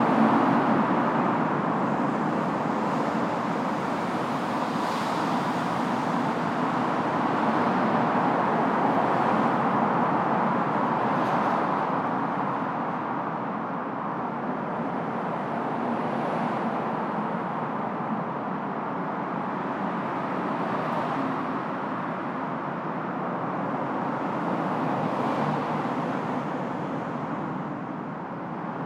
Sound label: CAR WIDE LOW CUT 150